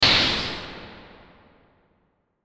Hit Burst Static; Production Element Imaging Element Accent Transition; Blastwave

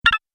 Interface Sound, electrical button; Twistedtracks

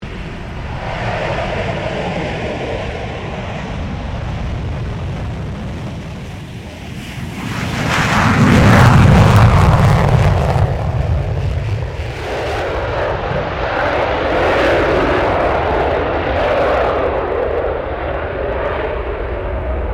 F16 Falcon takeoff; Airplanes; Vehicles

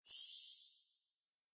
Whoosh Twinkle Delay; Blastwave; Whoosh Sound Effects

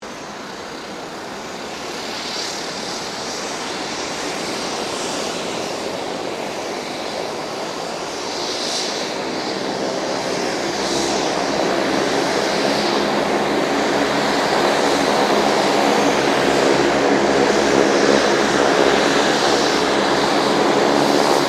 King Air 350 startup; Airplanes; Vehicles

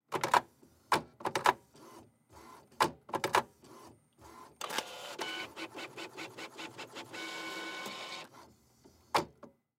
Label Printer; Office; Label Printer Industrial Print Single Page; Printers; Blastwave

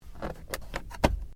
Hyundai Accent Coin Tray Open; Hyundai Accent 20 08; Hyundai hire car; Vehicles; Cars

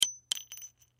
Bullet Shell; Bullet Shell 38 Special Drop Cement; Drops (Sound Effects:Objects); Blastwave; Warfare

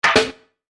Chime Percussion; Multimedia Web Interactive; Blastwave; Technology

